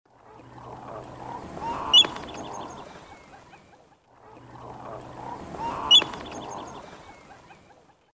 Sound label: Porphyrula martinica, flight call; S. America; Eastern Lowlands